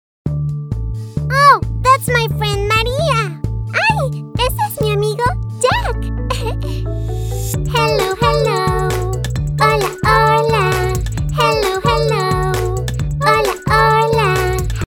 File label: Hello Hola sing along learning songs